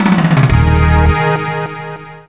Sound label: Checkpoint; Arctic Thunder